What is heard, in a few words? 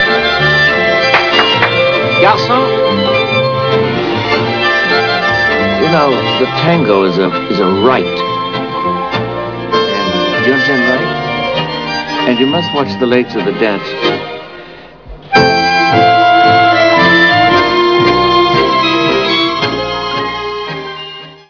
tango